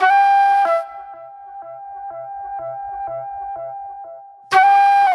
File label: Sharp Delay Flute